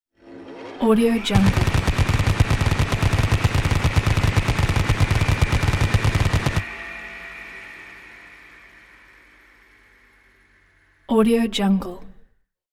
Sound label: machine gun